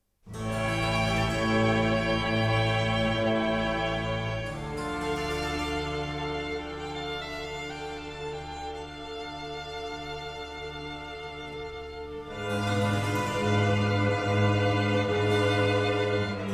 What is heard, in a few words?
Concerto for 2 Trumpets, Strings and Continuo in C